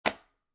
Book Paperback Place On Desk, Foley, Book, Paperback, Placed On Wooden Desk; Set Down